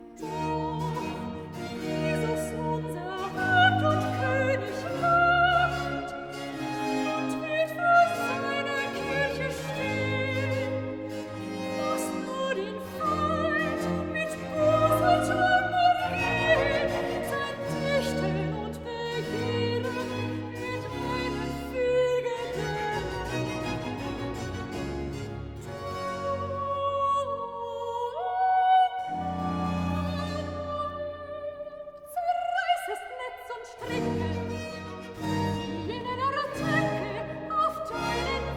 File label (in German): Sopran, Accompagnato